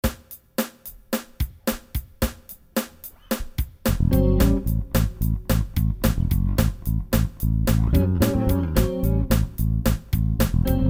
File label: groove